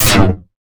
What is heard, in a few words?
Radio Hit; Transitional Elements; 1001 Sound Effects